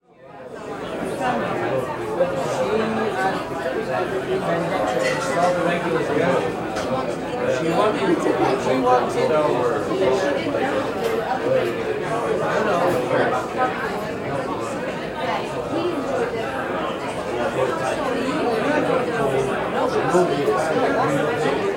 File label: Bar Pub Medium Crowd in Bar, Walla; Sound Ideas; Walla Series